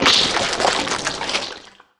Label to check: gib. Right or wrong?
Right